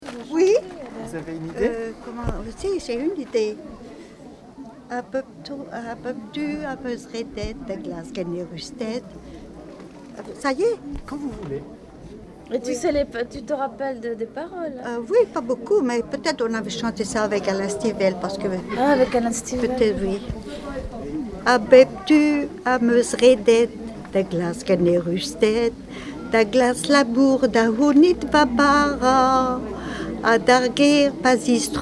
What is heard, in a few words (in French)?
pardon chant breton; lesconil